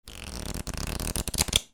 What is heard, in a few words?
CASINO